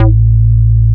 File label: Bass Shot A